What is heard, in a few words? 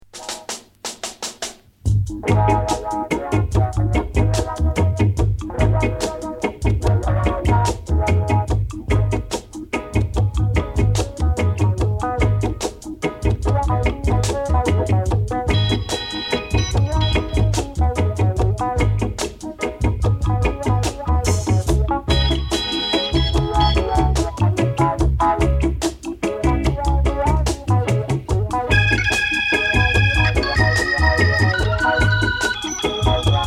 Soul Jazz Records; Studio One